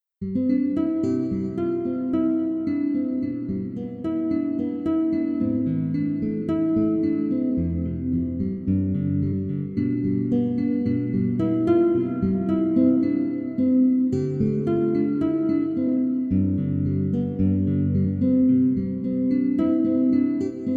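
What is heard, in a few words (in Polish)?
432 Hz, Muzyka o charakterze muzyki filmowej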